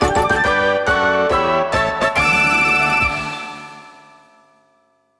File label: Win Short